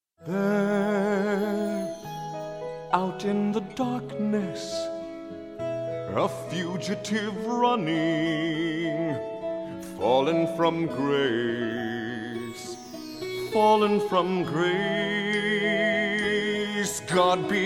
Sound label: baritone